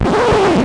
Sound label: scratch; KABOOM! - Wild and Wacky Sound Software; house; damage